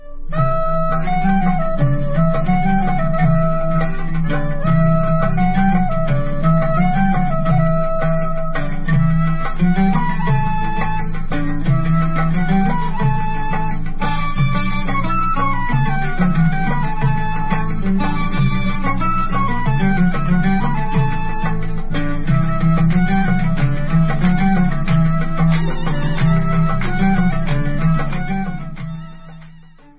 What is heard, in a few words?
instrumental